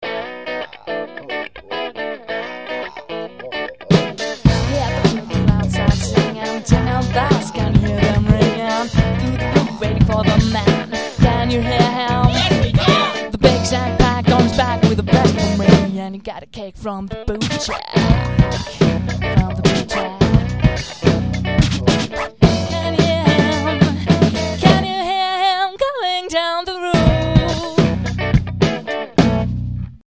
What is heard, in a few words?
x-mas jingle